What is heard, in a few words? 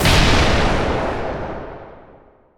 Enemy Rose Queen Active Attack Hit